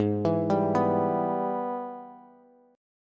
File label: Minor Major 7th Sharp 5th, Bass; G#mM7#5; arpeggiated; 16,19,17,16